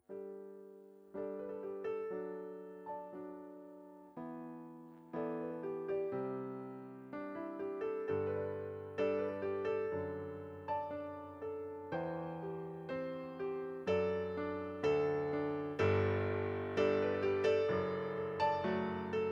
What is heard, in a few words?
steinmayer dp-360 digitalpiano; steinmayer dp-360 test